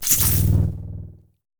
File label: Imager Squelch; moul